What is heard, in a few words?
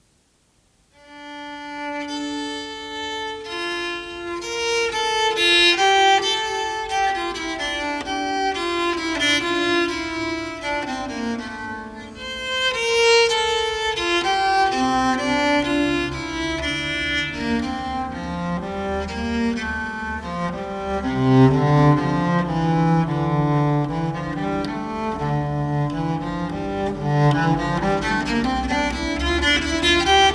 Recerchar terzo, Treble viol; S. Ganassi